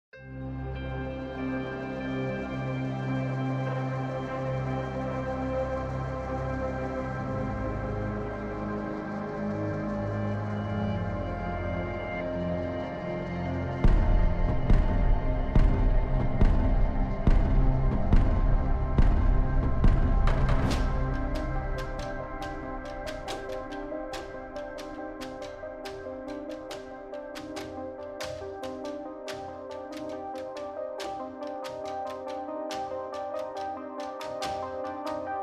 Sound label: song